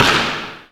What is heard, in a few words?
ball bounce; sports room sfx; birthday party bash; wii